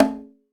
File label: Dynamic B, Alesis D4 Drum Machines